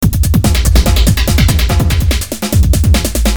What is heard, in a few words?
Loop